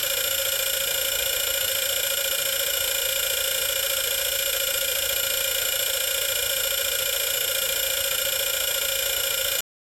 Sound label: motorsports